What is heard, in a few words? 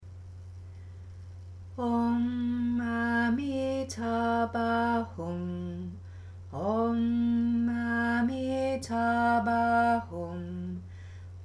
Chant Sunset Chant